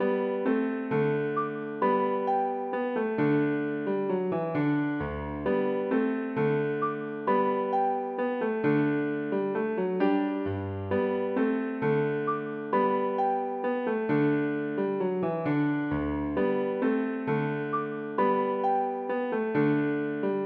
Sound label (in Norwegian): Engels Kloken spil for piano